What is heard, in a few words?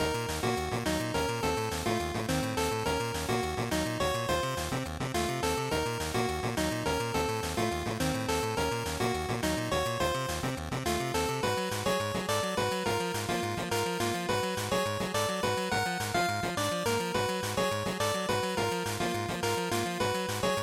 Battle Loop; GB RPG; bgm